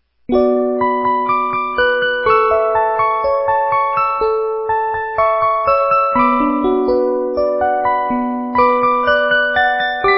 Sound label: 30 Note